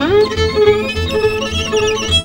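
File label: VIOLIN GROOVE; Best Service; World Colours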